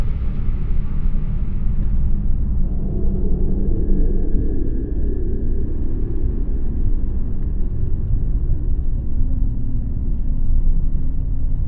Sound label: juggernaut molluscoid idle; Stellaris; federations